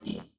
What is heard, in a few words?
equip energy, weapons; cstrike; valorant; singurality phantom